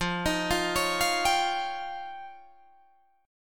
F 7th Suspended 2nd Sharp 5th, Guitar, Drop D

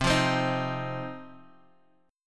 C Minor Double Flat 5th, Guitar, Half Step Down

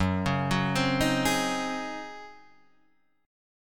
Eb Minor 13th Gb

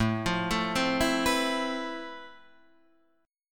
F Major Sharp 11th A

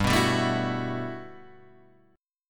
G Major 13th; strummed; JGuitar

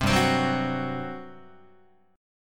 G Major 7th Suspended 4th, Guitar; GM7sus4 strummed; JGuitar

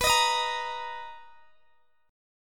B Major 7th, Guitar Open D chord

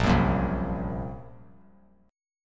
A sharp Suspended 2nd Sharp 5th A sharp; A#sus2#5 strummed; JGuitar

